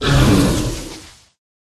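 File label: flying armor stun; letaushii dospeh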